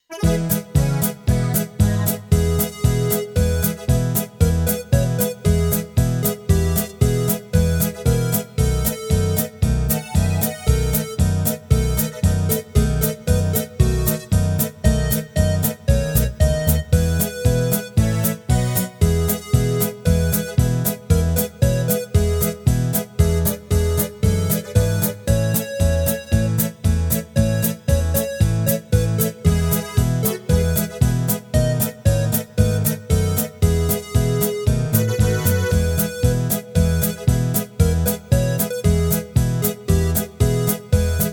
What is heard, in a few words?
Duo, polka